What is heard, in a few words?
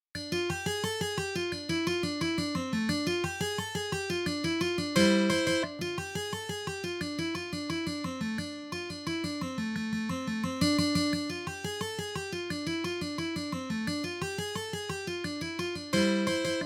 mandolin